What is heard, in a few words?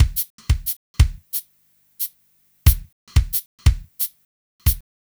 Smooth, R&B Drums